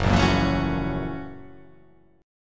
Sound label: F sharp 7th Sharp 9th